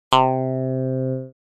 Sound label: Jaw, synth lead